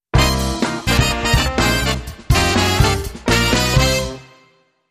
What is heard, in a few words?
Funk Brass, Jingles